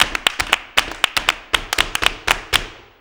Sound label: CLAP + STEP, MCLAPS+STEPS; Best Service; World Colours